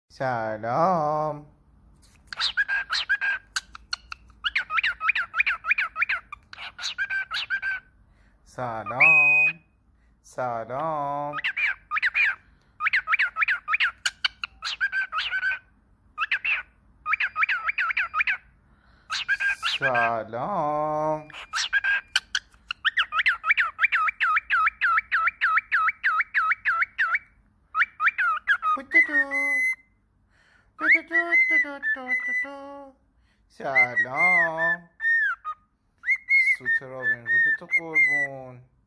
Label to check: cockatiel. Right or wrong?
Right